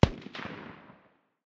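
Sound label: light machine gun far distance; tacz-tweak-pack; taczmechanics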